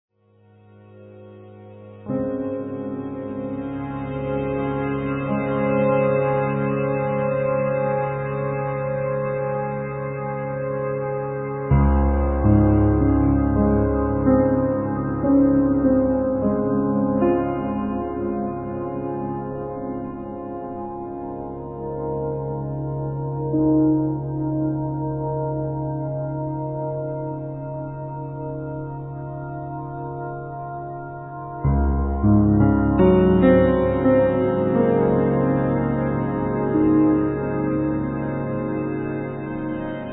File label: piano textures